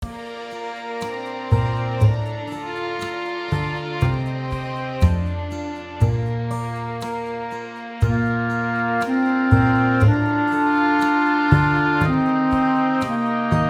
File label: Clarinet in B flat